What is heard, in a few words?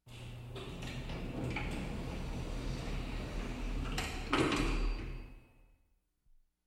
Elevator Door Closing